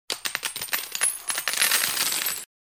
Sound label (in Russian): Zvuk deneg